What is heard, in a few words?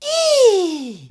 eee male, voice; ooh ahh